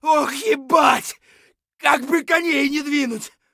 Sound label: hurt light; Escape from Tarkov; scav1